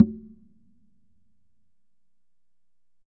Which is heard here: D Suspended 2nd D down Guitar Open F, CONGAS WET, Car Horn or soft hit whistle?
CONGAS WET